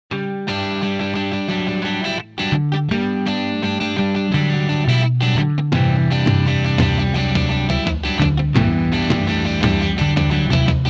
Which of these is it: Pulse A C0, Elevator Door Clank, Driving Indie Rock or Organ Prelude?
Driving Indie Rock